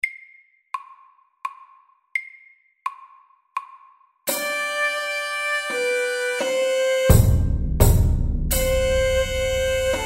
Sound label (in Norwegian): Stomp MIDDELS